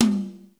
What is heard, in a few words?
Tom High, Drums, Roland JV 1080